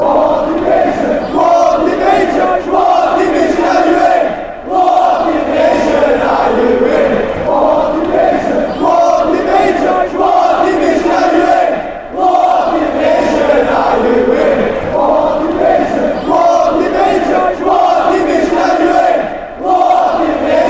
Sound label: what division are you in fanchants